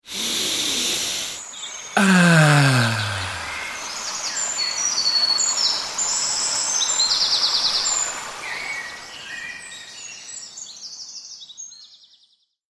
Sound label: Man breathes in and exhales a sigh content with nature sounds; Contentment Sigh; Sighing